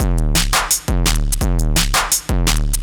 Beat Digi 170, distorted drum loops 170bpm; musicradar

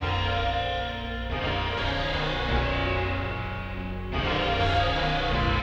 PAD, Hip Hop and R&B Drumloops; Best Service ProSamples vol.01; AKAI

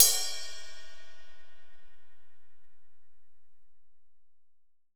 R. CUP, Drum Kit, AMBIENCE; AKAI S6000 CD-ROM - Volume 3; AMBIENCE KIT1

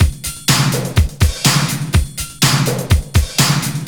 LOOP 124

